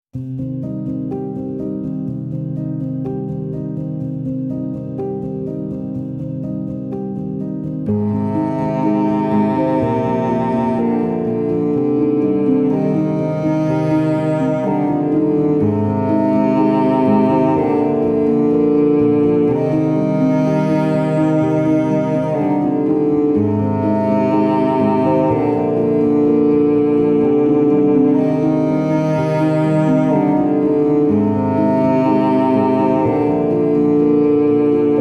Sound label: Rainstorm